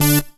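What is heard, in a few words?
synthesizer Dance; Best Service Gigapack 1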